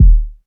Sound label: Kick; Ableton Live 12 Lite; Core Library; Drums